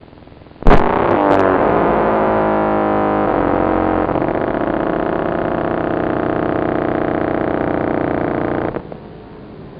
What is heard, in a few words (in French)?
NEURMYOTONIE